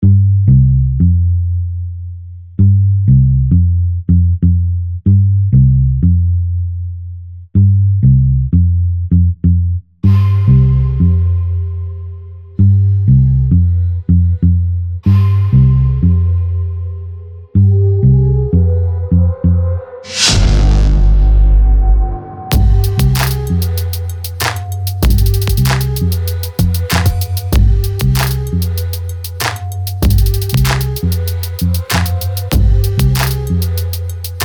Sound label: arab trap type beat